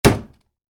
Wood, Light Impact: Plastic; Wood Sound Effects; Wood (Sound Effects:Materials); Stuart Duffield

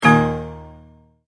Multimedia Tone-Stop

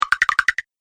Magic Tokens Game Fx; Video Game Sound Effects

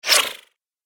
Cartoon Magic Spell

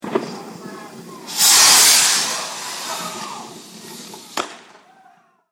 Fireworks Explosion Whoosh Bang And Ambience

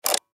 Button Switch

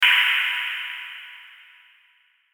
Hit Airy Shimmer; Sound Design

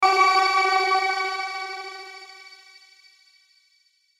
Hit Chopper, Hit Chopper Shimmer; Blastwave

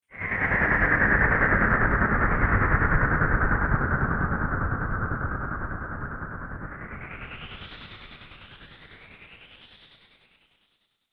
Astral Winds, Science Fiction Sound Effects

